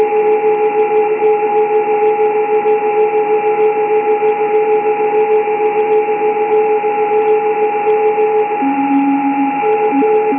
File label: 70 mhz, tropo